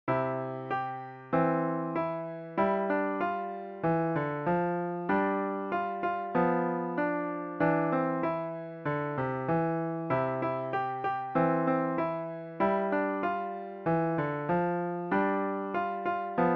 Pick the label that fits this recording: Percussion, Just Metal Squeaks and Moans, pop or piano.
piano